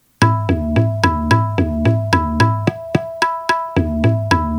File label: Trital, Tabla; Tabla-Transcriber